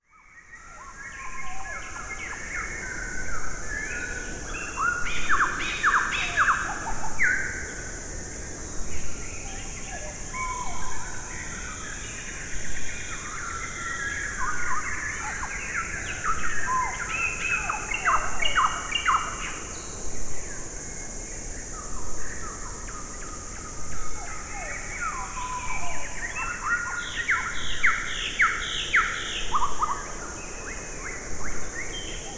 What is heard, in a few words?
nature forest birds insects morning spring early summer south Black Sea Bulgaria; Sonniss GDC